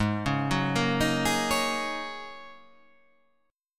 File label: G sharp 9th Suspended 4th G sharp